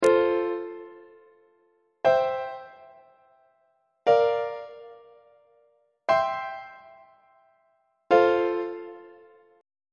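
f major inverted imperfect authentic cadence on treble clef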